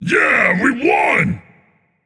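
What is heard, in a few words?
non-verbal yay; smite; atlas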